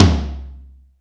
TOM LO AMB; Back In Time Records; Big Bang

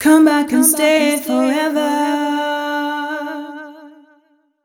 f 132 mel, Vocals, fem

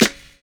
BUZZ SNAP; Univers Sons - Kitch 70s

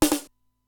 Simmons SDS-9, Drum Machines; Factory B4

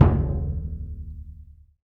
AFRO DUNDUN, LRG DRUMS; Spectrasonics; Heart of Africa

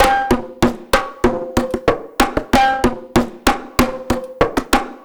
Perc 95; musicradar

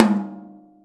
Concert Tom ff; Digital Sound Factory Virtuoso

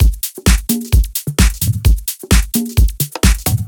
Straight, Drum Loops, 130 BPM; Underground UK House & Electro

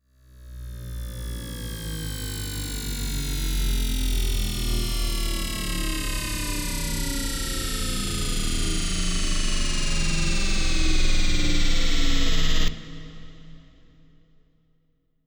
SFX Spaceship

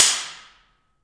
PRC ALMWA, Aluminum Wall; Rhythm Section; FX Perc

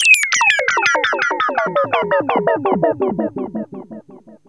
ANALOG ECHO; Zero-G Ambient 1

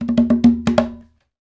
Bembe 6/8 Fills; Spectrasonics - Supreme Beats - World Dance

